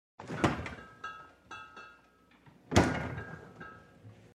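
otkr zakr dveri holod s butylkami, opening and closing fridge door with bottles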